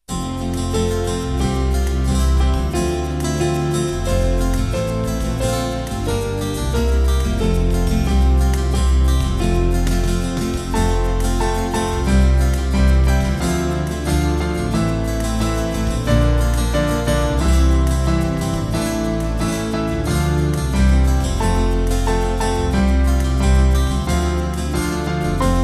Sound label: Band, Free Music Backing Files for Churches; Now Unto Him; Mortishire